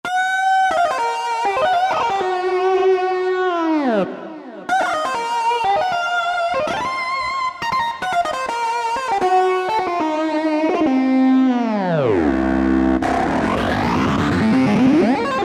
Dist Syn Chorus, Roland JD-990; Factory Patches

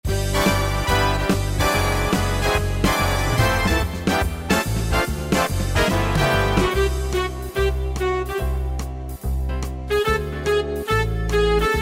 Orch Big Band, Swing & Jazz; Factory Styles

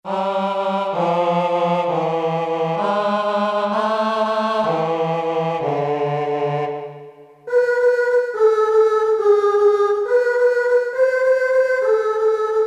Ah & Ou, Voices; Korg DSS-1

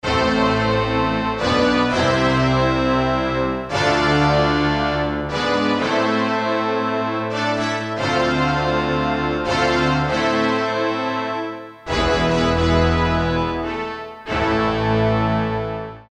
BRS & STR, Korg DSS-1

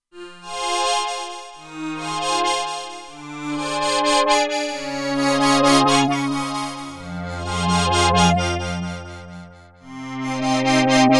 MIDI Echo, Roland Alpha Juno-2; Factory 2

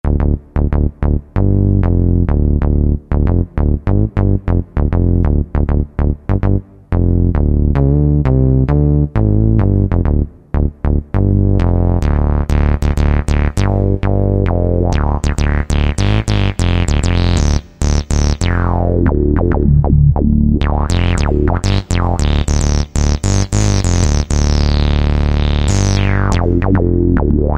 HiQ Bass; Yamaha YPT-400; Voices

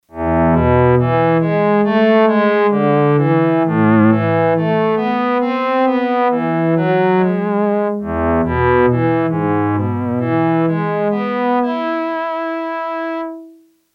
Ele Cello A, Yamaha TX802; Factory Patches; Voices

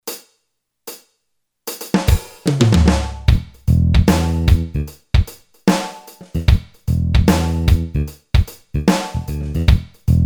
ROCK, Boss DR-3

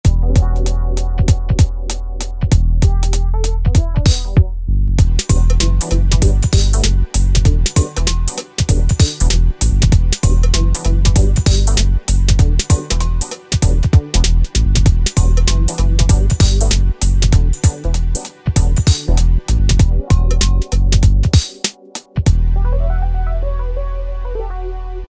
Bouncy, Yamaha DJX Styles

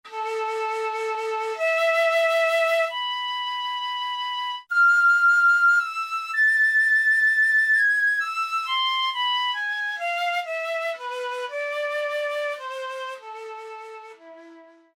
Fife, Brass And Woodwinds; Roland L-CDX-03

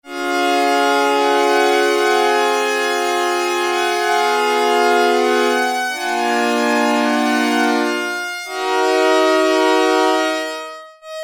High Violins, Prophet-5; Sequential Circuits Prophet-5 (Ver.3); Factory Patch; File 2 Programs

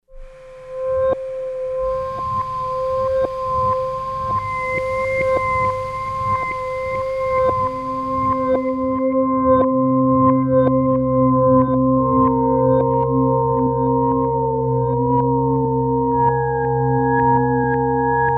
Found On Tape

